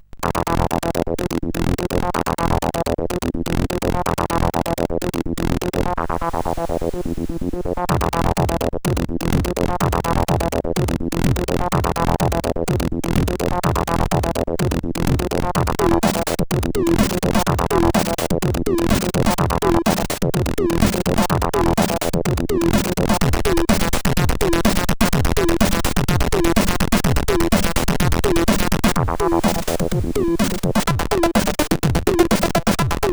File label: Erotic Techno, CSG8580R5